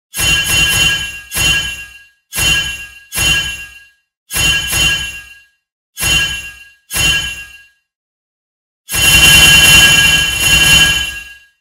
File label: enemy missing ping